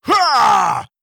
effort grunt male

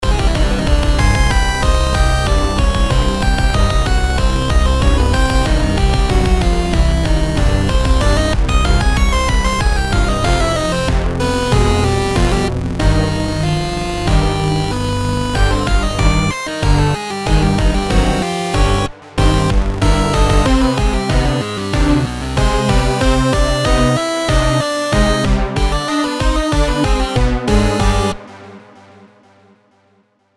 Metal (Chromatic, Electronic)

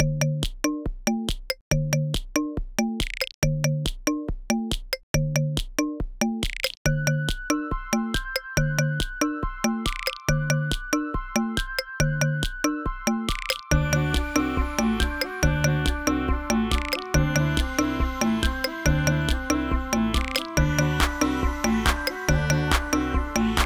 bouncy menu loop

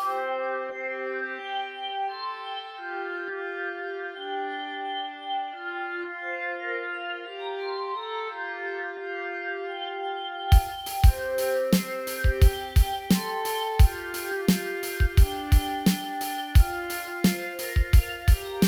ambient loop

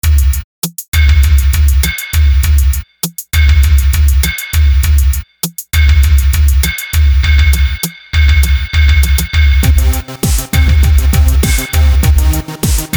beat

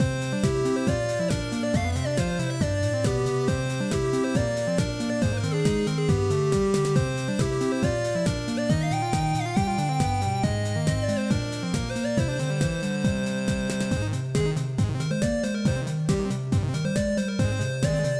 Chiptune Adventure

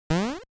jump; Jay The Doggo

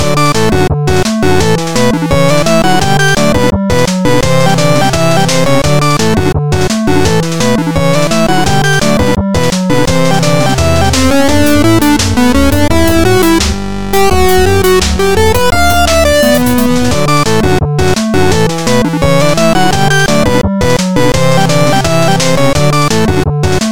Happy Accident Simple Short Loop

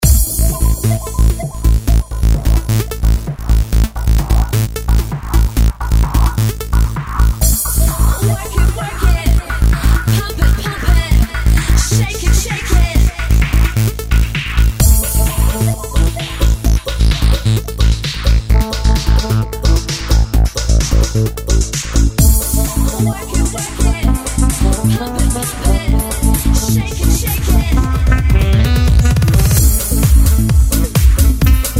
The Sax (Electro House Loop) 130BPM

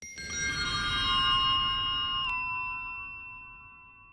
tinkle; Scuzzane

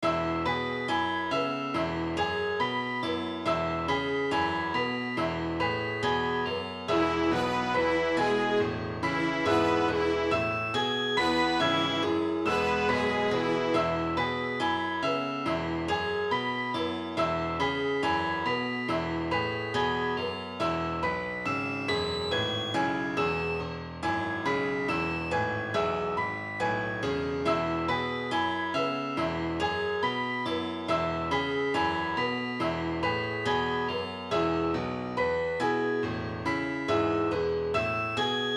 youthful elf seeking adventure

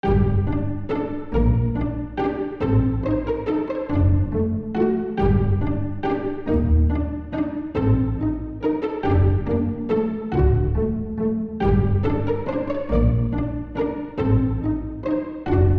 Spirit Waltz

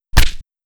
punch

